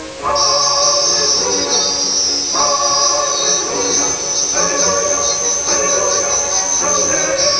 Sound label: music